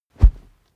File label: whoosh cloth low soft; swooshes-swishes